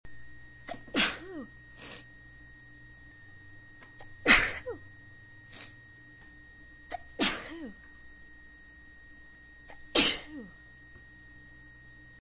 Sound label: 3 sneezes, female